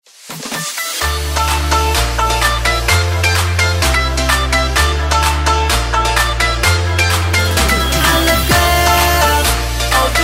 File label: Afrobeat Redrum; remixplanet